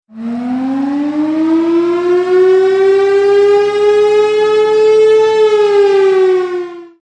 Loud Ringtone Tornado Alarm Sound Siren

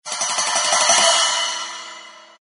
cymbal splash

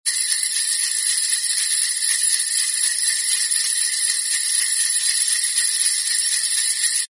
bell sleigh small continuous ring

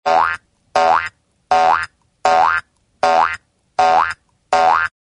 comedy boing sproing

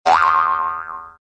comedy spring sproing

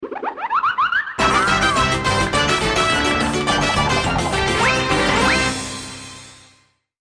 Cartoon Alert

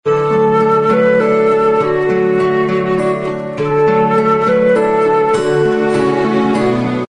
christmas silent night opening

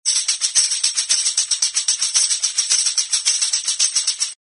perc tambourine rhythm shake

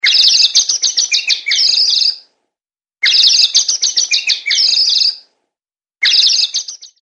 Comedy Birds

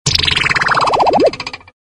comedy big warble

